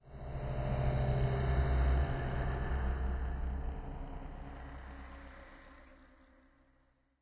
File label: entrance, undeadtower